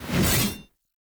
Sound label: Two Handed Axe Whoosh; Weapons; Enemy; Axes; Sinecure Two Handed Axe